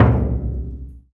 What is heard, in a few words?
LOUD DRM, BURUNDI; Spectrasonics; Heart of Africa